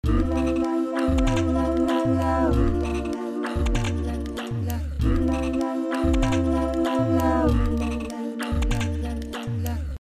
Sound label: mastication source